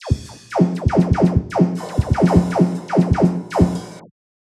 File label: drum mix